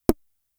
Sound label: Snare, Drum Machines; Fricke Schlagzwerg